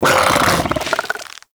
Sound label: Bug Vocal Tranquillized Grunt; Characters; Warframe; Hunting Critters; InfKDriveBug